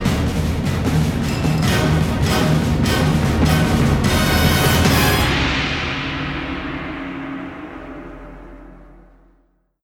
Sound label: Sting